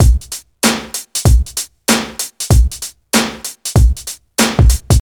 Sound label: 96 bpm breakbeat A sharp key; drum loops